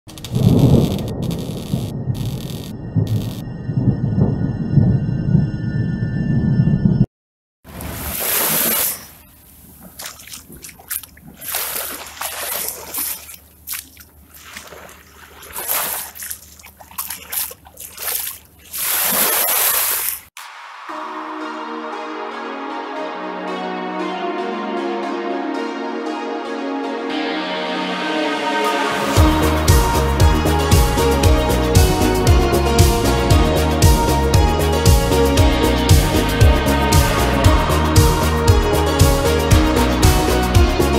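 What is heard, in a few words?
italo disco euro disco euro pop; NEW Italo Disco; Best Euro Disco; Super Euro Pop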